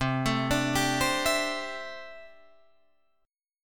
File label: C 6th Add 9th C down Guitar Drop D